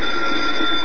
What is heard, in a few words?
times up